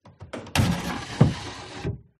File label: Open Drawer, Old, Wooden, Movement, Drag; PMSFX